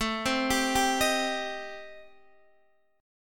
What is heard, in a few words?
A-Minor 7th Flat 5th, Guitar DADGAD, chord